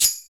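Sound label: PER PROCU, Procussion, percussion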